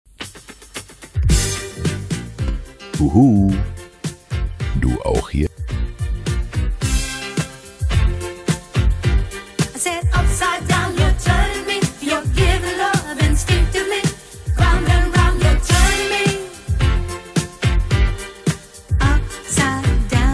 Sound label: Disco